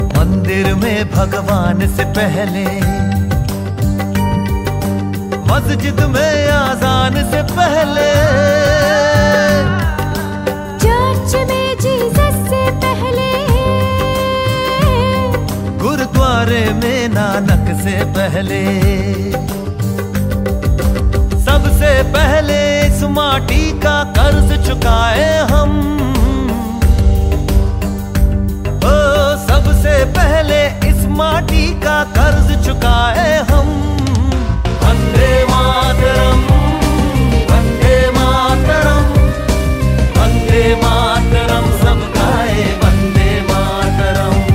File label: Vande Mataram Ringtone